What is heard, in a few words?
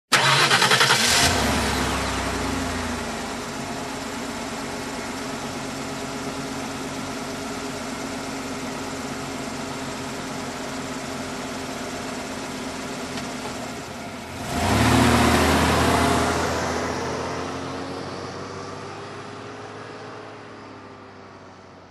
Car engine ignition and drive off